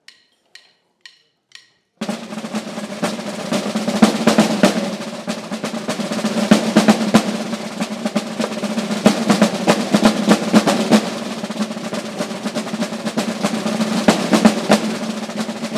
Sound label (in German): Tambouren Schweizer und Franzosen Tagwach